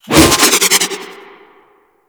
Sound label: Hyena Cinematic Spin Whoosh; Warframe